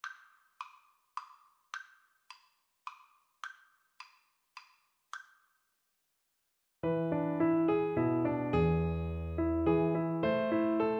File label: dark eyes, Tuba